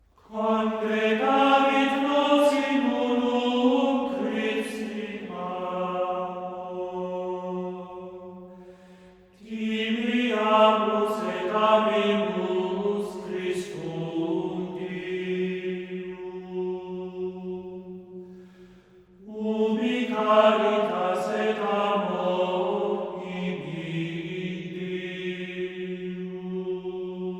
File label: Gregorian Chant, Antiphon; Konrad Ruhland Conducts Gregorian Chant; Congregavit nos in unum; Ubi caritas et amor; Psalm 133 (132), Verse 1